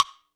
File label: kit, Yamaha Motif-ES8